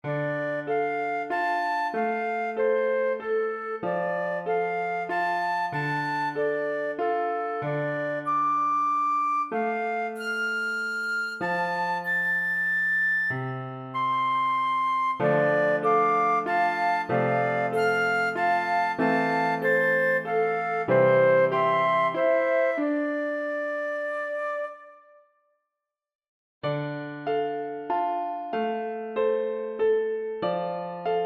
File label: flute and piano